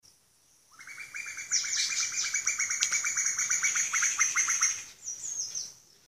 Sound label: SMS oriya